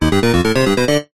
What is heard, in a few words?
multimedia game tone retro positive complete bright